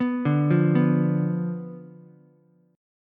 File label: B Diminished B down Ukulele; Bdim arpeggiated